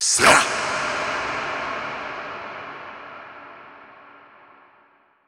Viking Vocal Hits Am 87Bpm; Construction Kits; VOCALS; Ghosthack; SkyRealm; Kit 18 - Shivering Sea

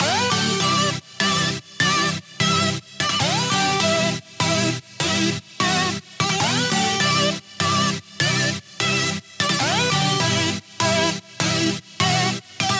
Raptor Chord Loop 150 BPM E min, Dubstep; Chord Loops; Synths; Cymatics; Dubstep Starter Pack

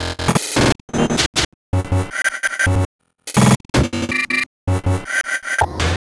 Glitch

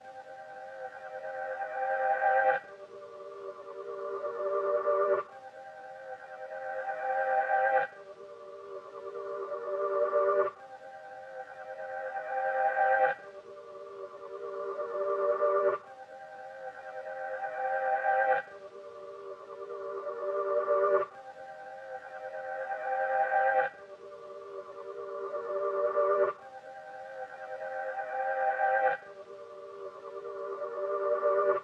91 BPM G Min Ambience; Loop Stems; Cymatics; Gravity

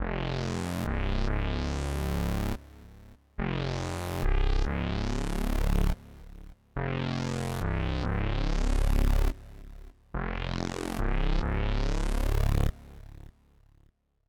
142 BPM F Min Bass, melody loop stem; Loop Stems; Cymatics; 2022 Melody Collection; Wavy; Filthy

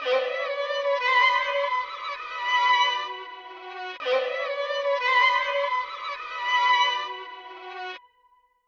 120 BPM B Min Reverse Violin; Melody; Stems; Cymatics; 2022 Melody Collection (BETA); Phantom

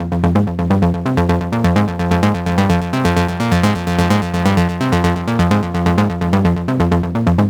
Melodic Loop Gritty Fmin 128, Melodic Techno; Ghosthack; AC2024 - Day 12 - Melodic Techno

